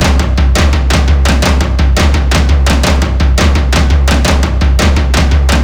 drums, zombat horde; szf

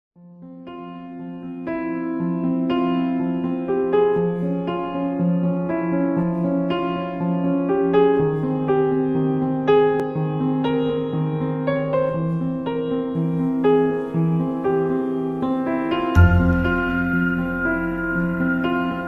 skill tree menu music; zombiesurvival

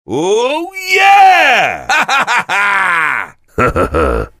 soldier laugher big snort

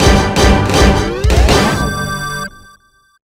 game clear; tf2ware ultimate; music game; 3ds

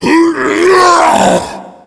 tank throw, yell

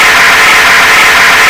scream; tf2ware ultimate

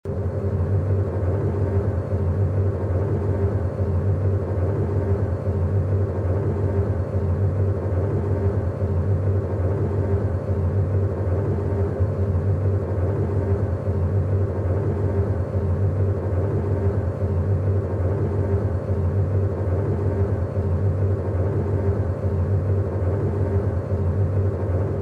ambience; slender

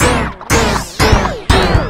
failure; tf2ware ultimate; music game

